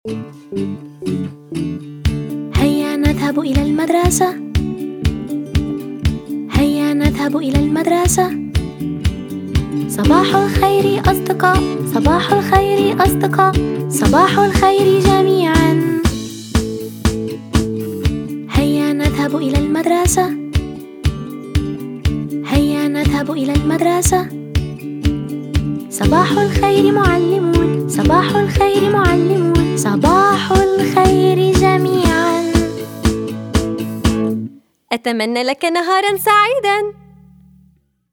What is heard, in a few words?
song arabic